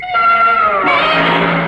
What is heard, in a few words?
godzilla blast; Various Strange Sounds and Effects